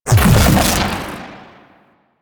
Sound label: Proj Perfect Pulse Impact; Warframe; The New War; Quests; Weapons; Omicrus